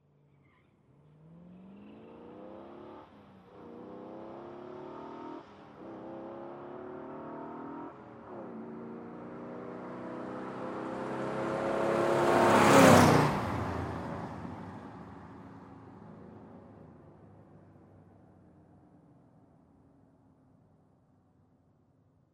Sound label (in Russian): pronositsja